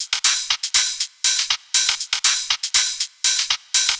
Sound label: Combo Hat